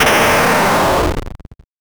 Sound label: 8 bit retro robot death